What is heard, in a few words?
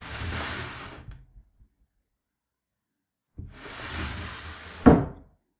Sliding Wooden Cabinet Door